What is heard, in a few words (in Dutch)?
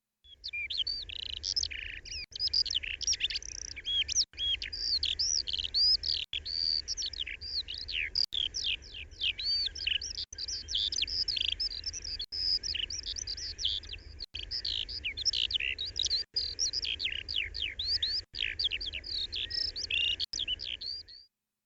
leeuwerik